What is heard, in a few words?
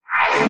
Quick Buzz Transition